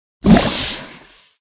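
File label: moray eel; murena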